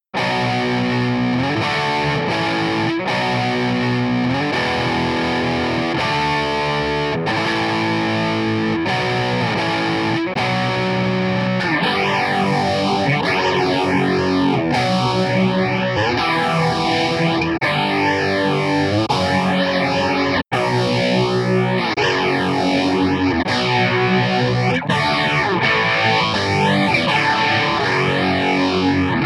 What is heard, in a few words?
12 Stage Dist Guitar Rnd Chaos LFO; chaos phaser; Chaos Phaser Demo